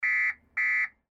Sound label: car alarm